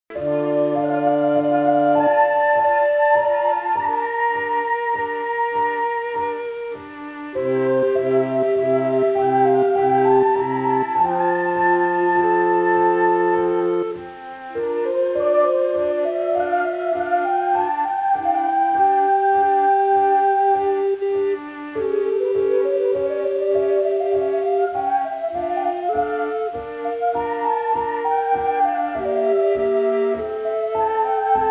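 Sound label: adagio flute; marcello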